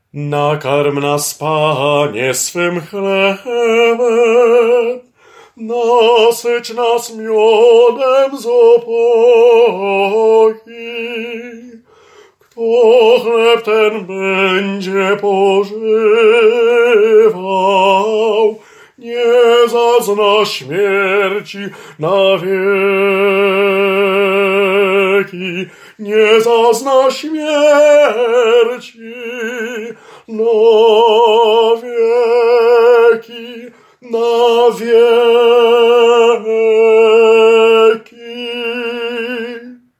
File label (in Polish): TENOR; Nakarm nas Panie